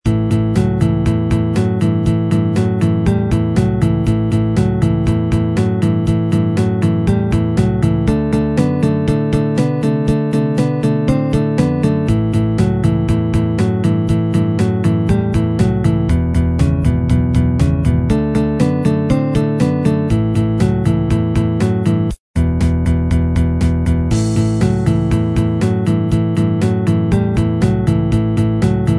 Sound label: example twelve bar blues with turnaround